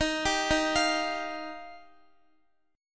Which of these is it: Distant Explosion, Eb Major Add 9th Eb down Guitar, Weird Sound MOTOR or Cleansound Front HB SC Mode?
Eb Major Add 9th Eb down Guitar